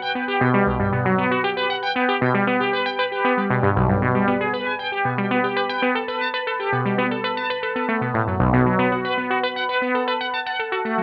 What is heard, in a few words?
Jazz